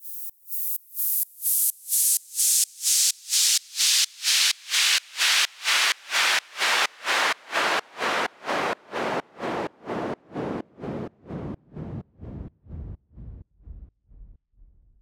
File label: Fall Side Chained